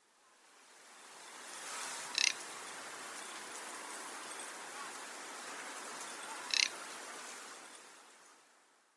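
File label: Garganey; chofu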